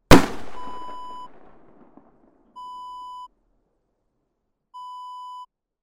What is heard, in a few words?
gun gunshot